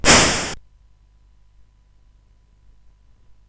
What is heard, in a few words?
sid CSG8580R5, GAMES; Dragons of Flame